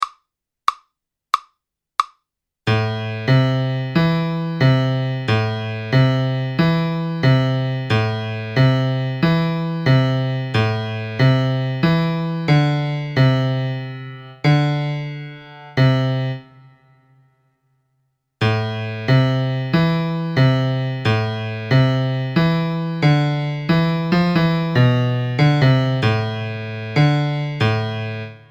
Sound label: blues 2 bassons voix 2